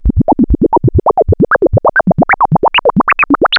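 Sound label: SYN-FX, synth; E-Lab; X Static Goldmine 1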